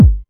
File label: 909 Kick, Drum Machines; Novation DrumstationV1